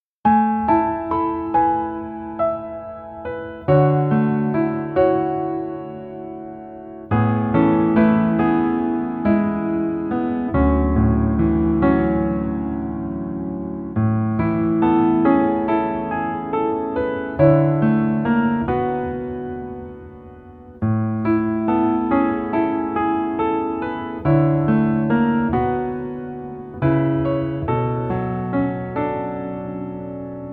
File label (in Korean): Piano ver 멜로디MR 남자키